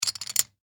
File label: Sports Poker Chips Set Down Stack Place Bet Clay Professional; Casino